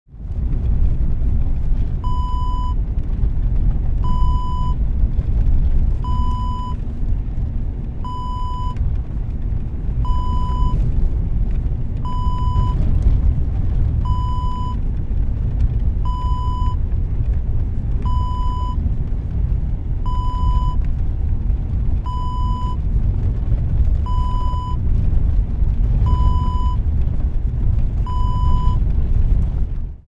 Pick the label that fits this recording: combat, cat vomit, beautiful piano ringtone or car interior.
car interior